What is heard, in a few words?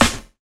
SNARE; E-Lab; X Static Goldmine 2; akai